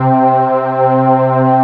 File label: one pad; Best Service Dream Experience